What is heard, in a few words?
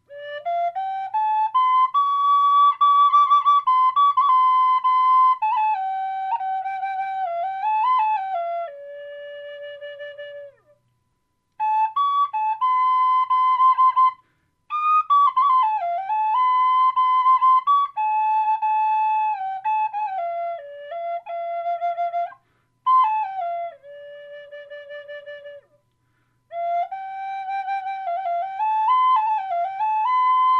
high d, flutes